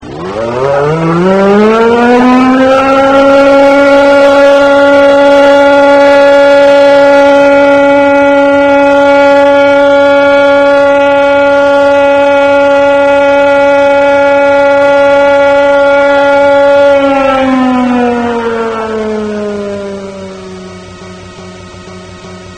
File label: sairen; oyubari